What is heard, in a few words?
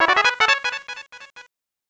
gain medal; svencoop; scxpm